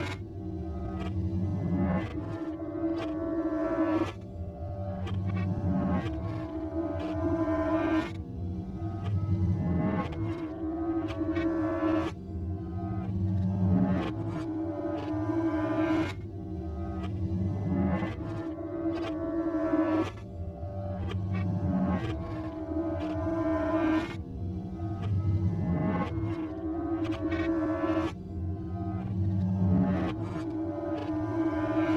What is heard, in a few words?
Mirrors Horror Soundscape; dark ambient music